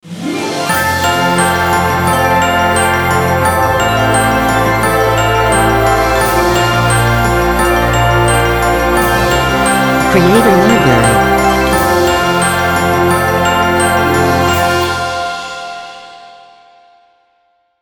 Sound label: Christmas Chimes Ident